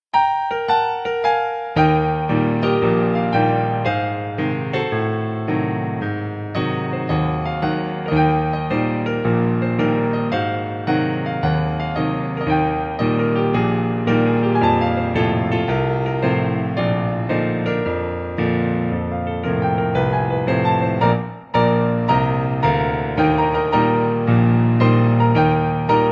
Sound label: Piano Song; One Piece